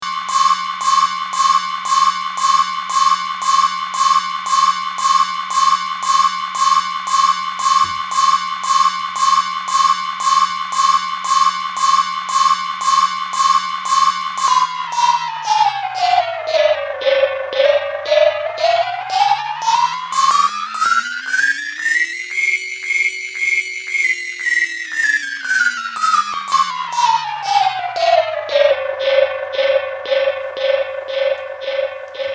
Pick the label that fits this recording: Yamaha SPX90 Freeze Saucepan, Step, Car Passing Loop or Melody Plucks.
Yamaha SPX90 Freeze Saucepan